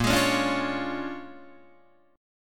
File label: Bb 7th Sharp 9th Bb Guitar Open D, chord